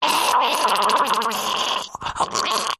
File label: human male strangle choke die vocal; zapsplat